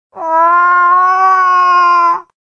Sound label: Calf